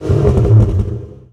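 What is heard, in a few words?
Truck Cherokee Rev Down; SFX Kit; Trucks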